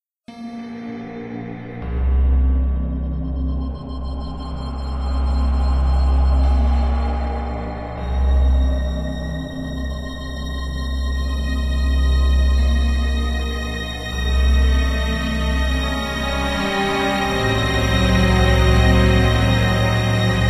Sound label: haunted forest